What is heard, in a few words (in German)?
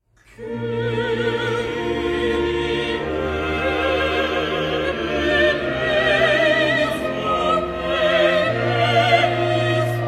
Kyrie Kleine Orgelsolomesse